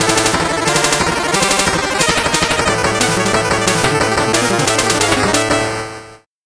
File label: 8bit jingle normal